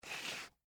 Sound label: Dress Shoe Scrape, Concrete; Footsteps; BlastWave